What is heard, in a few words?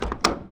Door Open; Game Essentials